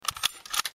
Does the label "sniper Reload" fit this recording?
Yes